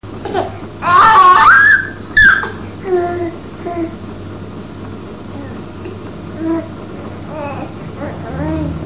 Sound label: squeek, baby; 5 mnths